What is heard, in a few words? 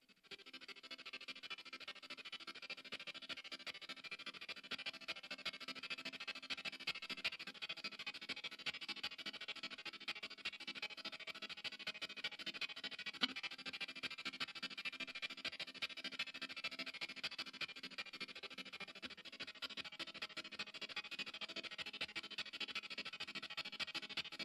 quiet effect, tremolo; empress; For your spy movie film scores... (it's quiet)